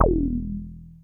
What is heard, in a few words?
JUP.8 E2, JUPITER 8, Rare Synthesizer; Hollywood Edge; Giorgio Moroder Rare Synthesizer Collection